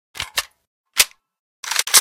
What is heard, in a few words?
l85 reload, weapons